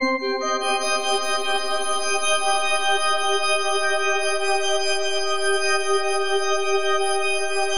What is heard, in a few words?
FM C MIN; Bolder Sounds